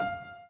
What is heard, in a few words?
Piano Chord